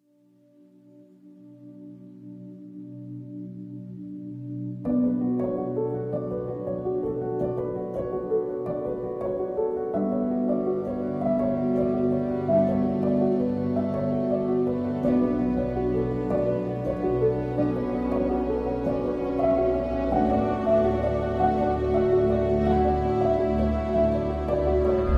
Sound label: Beautiful Emotional